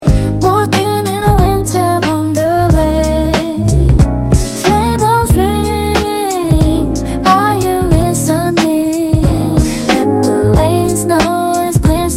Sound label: Soulful Jingle Bells